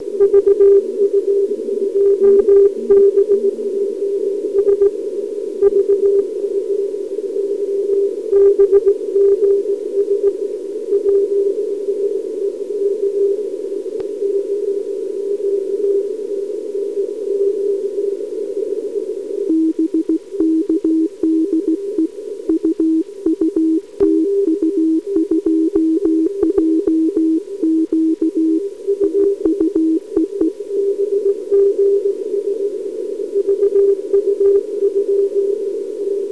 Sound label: cq 160 cw; vu2bgs